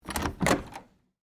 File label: Door Open Hollow, Foley, Door, Open, Hollow, Wood, Basement; Miscellaneous Door Sound Effects; BlastWave